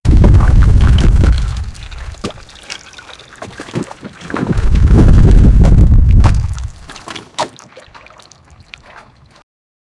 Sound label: mudslides and